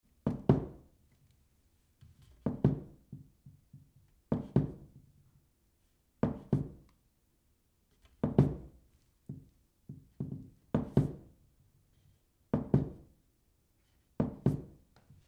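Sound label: sounds of bar stool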